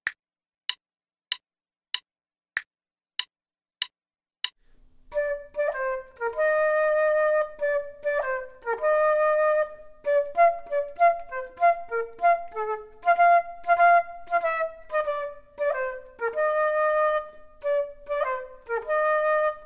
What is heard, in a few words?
Duets flute; Selected Duets for Flute; flute 2